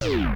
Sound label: door closing